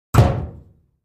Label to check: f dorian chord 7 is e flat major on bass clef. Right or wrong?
Wrong